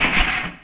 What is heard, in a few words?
fence; WCWDEMO